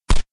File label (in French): Clic Vide